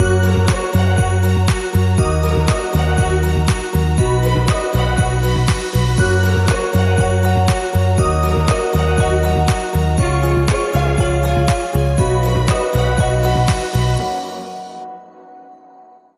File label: No Melody